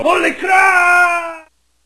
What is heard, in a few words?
death; fortress